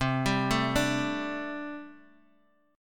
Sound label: G Suspended 2nd Suspended 4th C